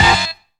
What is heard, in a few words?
HONKY HIT; HITS+STABS; Zero-G; Funky Elements